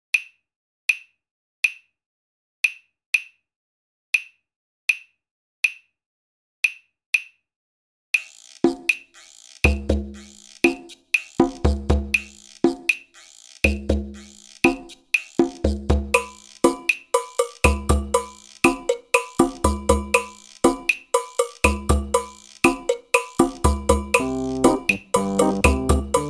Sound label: cuban perc